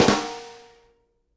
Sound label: snare GLASS, Live Snares